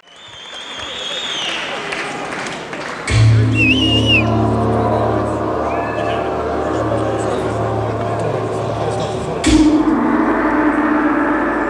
Percussion Solo

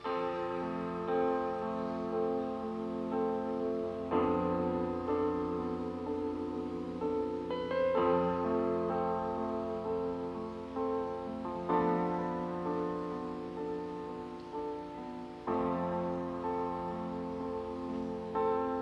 Piano Solo

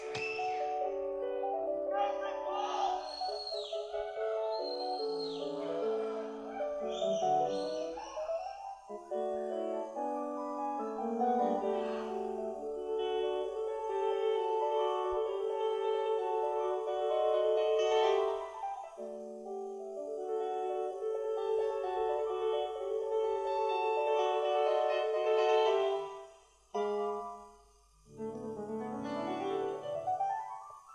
Piano Concerto K466

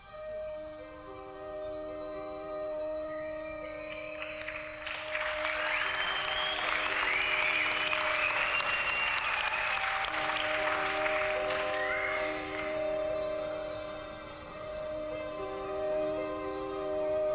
Piano Improvisation

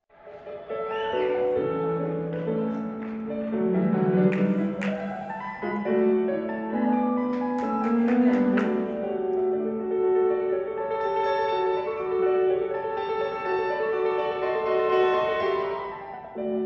Piano Solo

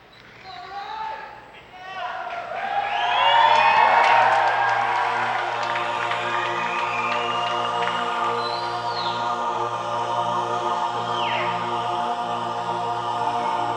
Octapad Solo

